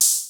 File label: Hi Hat, Cwejman S1 MK2, Drum Machines; 300 Drum Machines